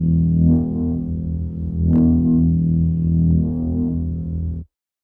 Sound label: lightsword mixed swings; soundscrate